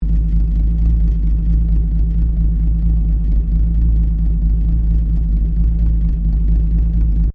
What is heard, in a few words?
CAR HOLDEN HD PREMIER IDLE EXHAUST, Classic Australian cars; 1965; Cars (Sound Effects:Vehicles)